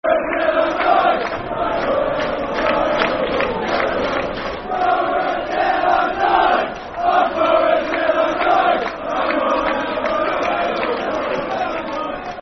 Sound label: Football Chant, Soccer Song; Boro Till I Die; Peterborough United Pufc